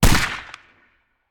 Musket; Sound Ideas; Guns

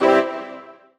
PUZZLE Success Brass Stab Wet; stereo; Universal Sound FX

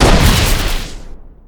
Explo Energy Fireball; SFX Kit; Destruction

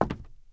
wood; firefight

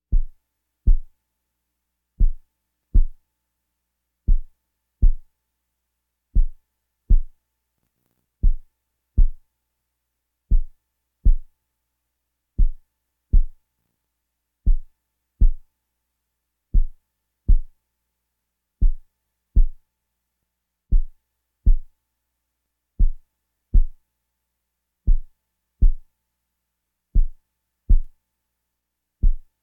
Walking Machinery Trucks

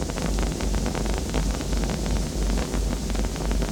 Fire Torch Loop; Destruction

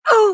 female pain; firefight

